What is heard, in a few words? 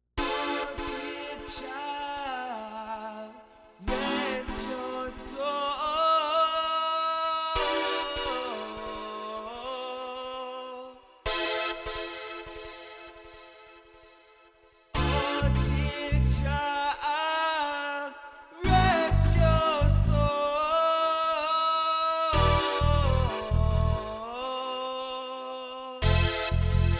chillout downtempo mix; Gentle Waves